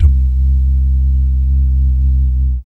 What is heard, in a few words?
BS DUMMP; Spectrasonics; Vocal Planet; Jazz FX